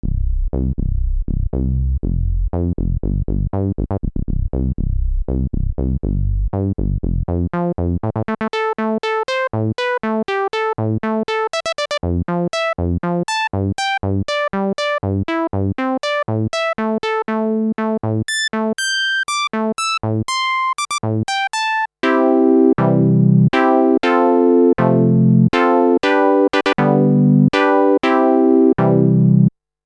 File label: roland v-synth xt filter dyn tvf12db q050